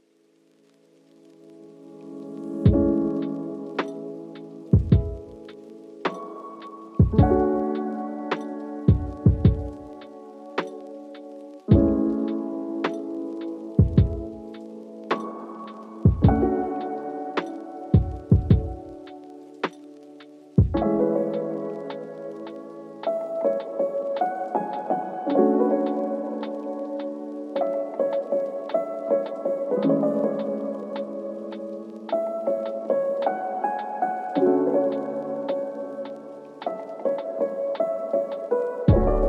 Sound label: vibey lofi chill instrumental